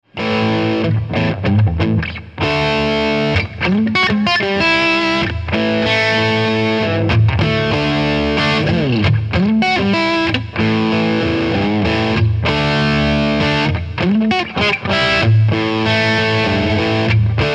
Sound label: Overdrive, Cyber Deluxe